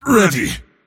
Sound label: move pain; dota; mars